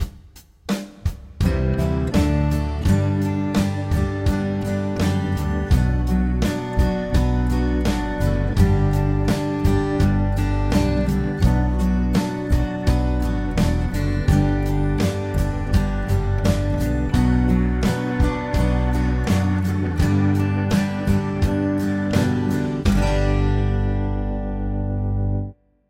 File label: major key chord singles; harmony